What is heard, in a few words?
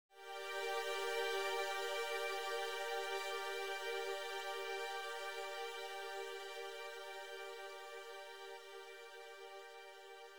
string pad example